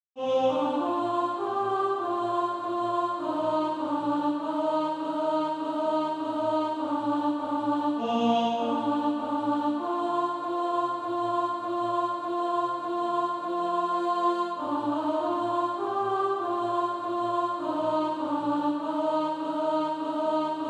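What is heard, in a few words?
alto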